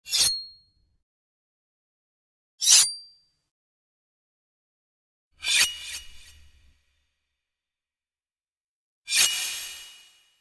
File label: Knife Or Sword Slings